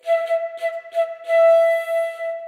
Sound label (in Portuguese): logotipo sonoro operacional; infraero